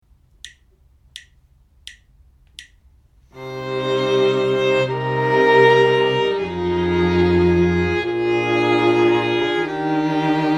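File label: harmonic dictation, string quartet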